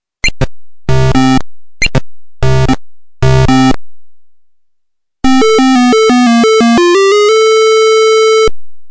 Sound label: X1; IceBlock